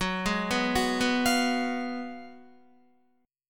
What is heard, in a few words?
F sharp Major 7th Suspended 2nd Suspended 4th, Guitar; F#M7sus2sus4 arpeggiated